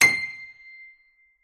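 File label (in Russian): арфа нота C8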